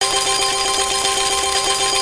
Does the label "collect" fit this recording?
Yes